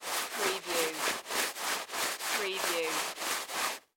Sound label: Cloth Brush Fabric